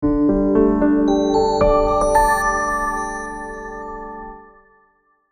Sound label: Audio logo